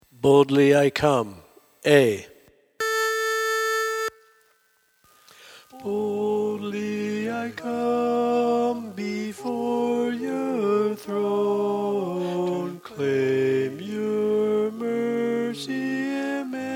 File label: Quartet Lead, Learning Tracks